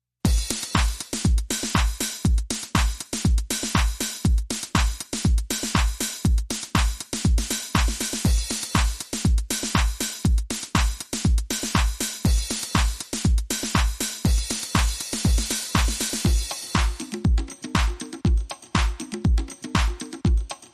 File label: Bonus Beats